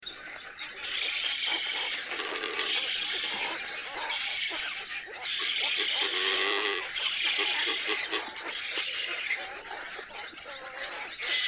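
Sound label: glossy ibis voice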